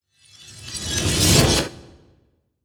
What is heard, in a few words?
Whoosh Debris Swirl; Blastwave